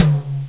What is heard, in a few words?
Low-Mid-Tom; Flying Nightmares Demo